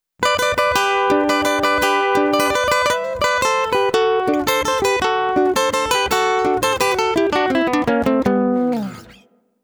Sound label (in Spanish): guitarra acústica